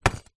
household pouch small contaning metal manicure tools set down on dressing table